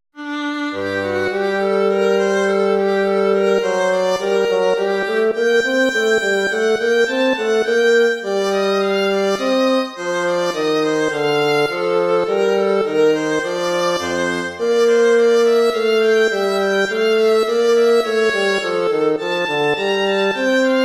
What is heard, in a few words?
courante